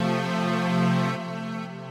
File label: SYNTHPAD, HOUSE, 125, A; eJay; Sphere